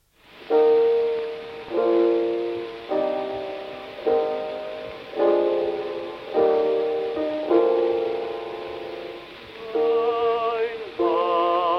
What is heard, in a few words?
Gramophone